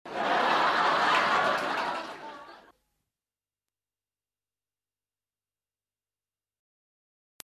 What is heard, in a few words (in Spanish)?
risas